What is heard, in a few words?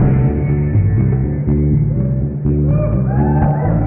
bass, Loops Phonky